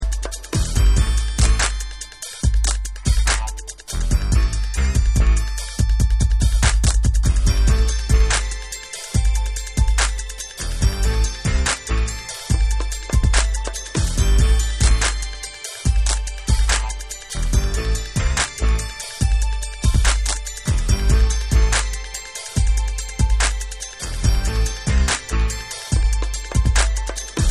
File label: Instrumental